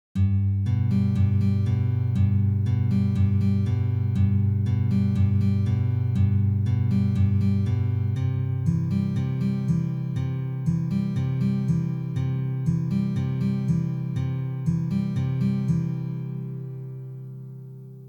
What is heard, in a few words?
fast